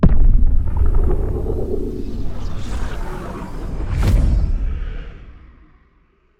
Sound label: Skybox Portal Open Nearby